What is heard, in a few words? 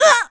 Hurt; Link getting hurt